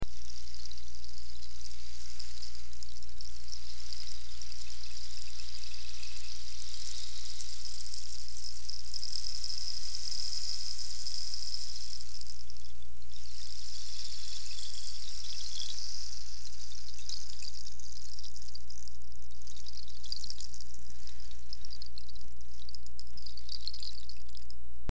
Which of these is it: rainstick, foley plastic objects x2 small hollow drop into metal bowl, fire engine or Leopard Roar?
rainstick